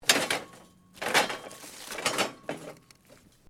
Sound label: METAL CRASH SMALL; Metal Sound Effects; Metal (Sound Effects:Materials)